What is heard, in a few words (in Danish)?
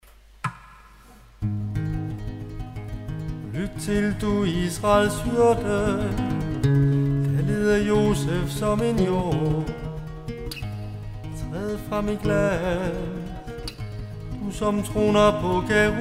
tone gregoriansk